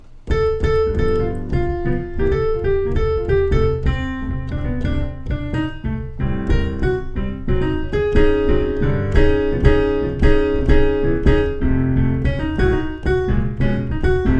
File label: wrong notes, jazz piano